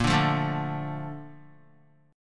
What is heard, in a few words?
A sharp Major 7th, Guitar; A#M7; strummed; JGuitar